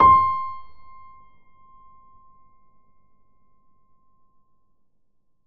Grand Piano C5 mf; Ableton Live 9 Essential Training

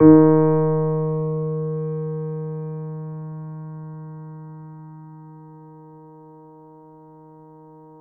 Grand Piano D#2 p

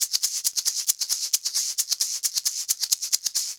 Shaker 134bpm

